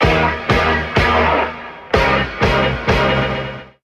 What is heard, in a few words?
horns smasher, funky drum loops